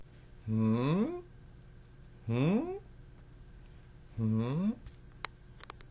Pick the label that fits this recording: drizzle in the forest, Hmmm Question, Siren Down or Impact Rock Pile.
Hmmm Question